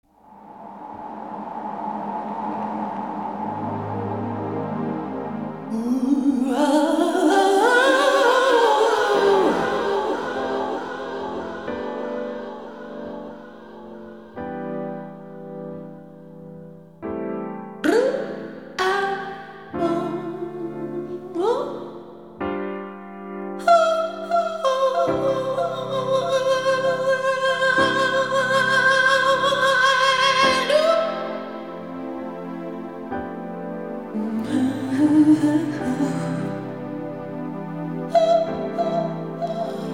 Instrumental Version

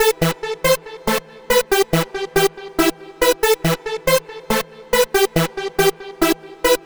Pinch Synth

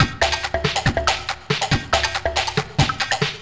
Perc Loop, Dance, Beats

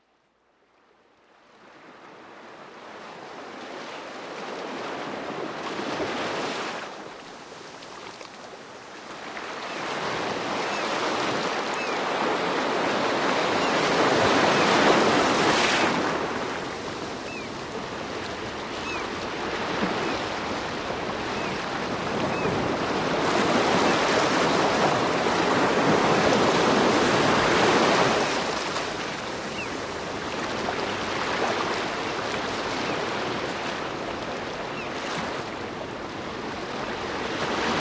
gentle ocean; Nature Sounds; Sounds of Nature Collection

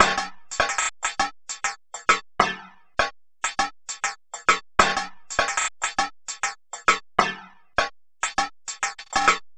Sala Hantle Beat

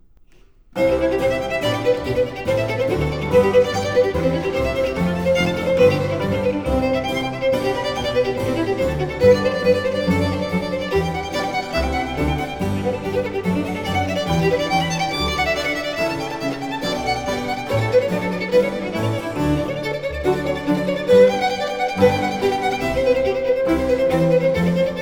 Violin Sonata in D Major, III. Allegro; Corelli; Violin Sonatas Op. 5; La gioia; Lina Tur Bonet; Musica Alchemica

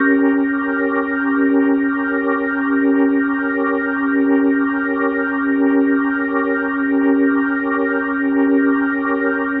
Medical Organ D#7; Dominant 7; The Vitale Organ

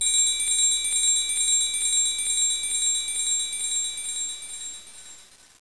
TRIANGLE

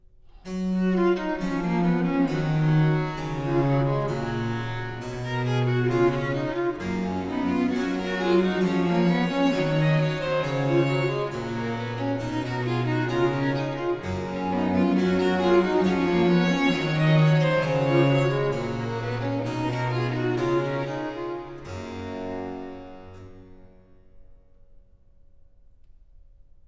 harpsichord solo, Canons on the Goldberg ground; BWV 1087; VII. Idem a 3; Bach; Musikalisches Opfer BWV 1079

